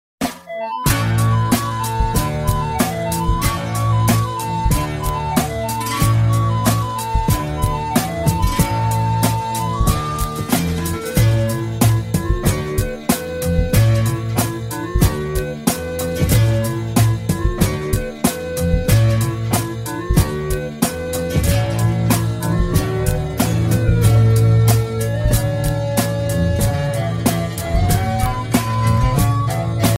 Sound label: music; Meepcity